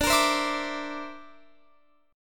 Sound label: D 7th Suspended 2nd Sharp 5th, Guitar Open F; strummed; x,21,22,19,19,21; chord